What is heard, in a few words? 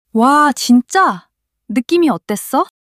surprise